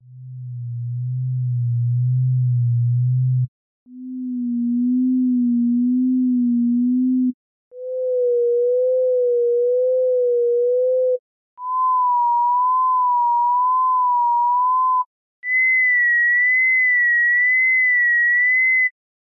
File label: LFO pitched sines